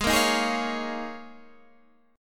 Eb 7th Suspended 2nd Suspended 4th Ab

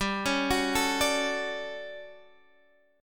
D Major 7th, G

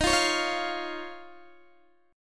D sharp 7th Suspended 2nd, Guitar, DADGAD

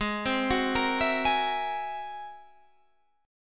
G sharp Augmented 9th G sharp

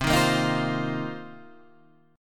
C Minor 11th C Guitar Standard; strummed; JGuitar

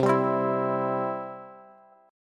C Major C down Bass; strummed; chord; JGuitar

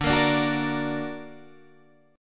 D Augmented Guitar Open D, chord

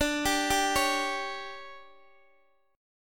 D Major 7th Suspended 4th, Guitar, Open D

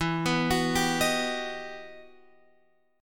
E Minor Major 9th, down Guitar Open F; EmM9; arpeggiated; JGuitar

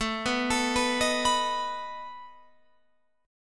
A Suspended 2nd Flat 5th A down Guitar Open F; Asus2b5 arpeggiated

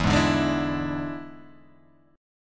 E 7th E down

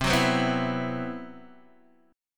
C Major 7th Flat 5th C, Guitar DADGAD

